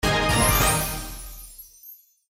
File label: Jackpot Wins; slots; Fortune of Olympus